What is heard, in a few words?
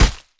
punch